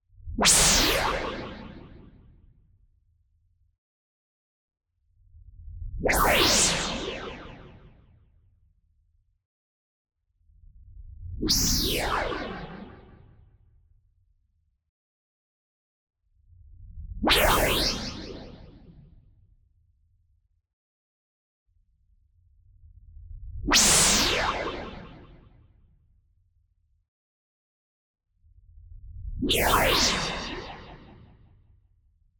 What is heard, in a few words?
WHOOSH Noise Filter Heavy Modulation; boom library; Anime Essentials